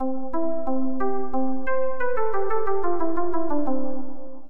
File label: BWV 779 12edo